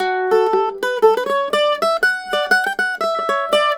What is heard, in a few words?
MANDOL 120 D; USB Soundscan vol.42; Celtic Flavours; AKAI